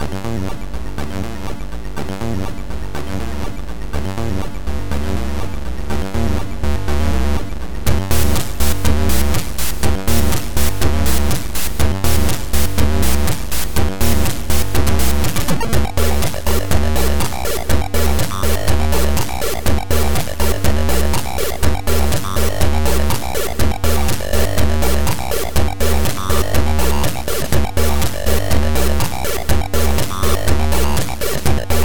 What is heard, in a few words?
turbo sound